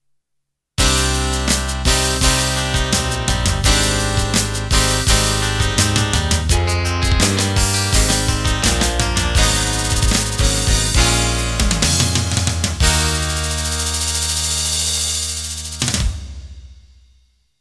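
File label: Rock Ballad End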